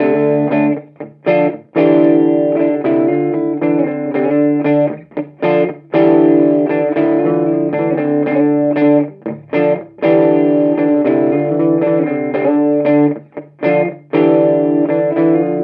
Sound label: British Vintage; Torpedo CAB M